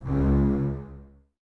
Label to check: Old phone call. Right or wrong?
Wrong